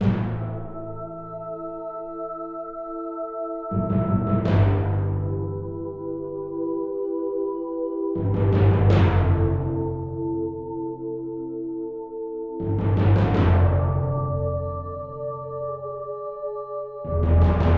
D 2-4 (Boys & Timpani)

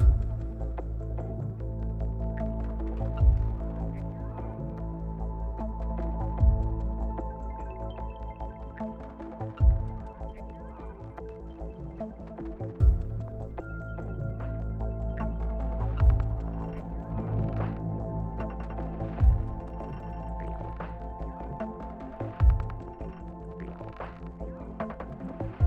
Ambience 75BPM

